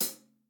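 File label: Hi-hat Paiste 302 14 P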